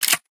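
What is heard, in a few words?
TOZ open, double barrel; svencoop; hunger; weapons; dbarrel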